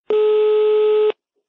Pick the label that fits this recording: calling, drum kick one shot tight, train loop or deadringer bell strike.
calling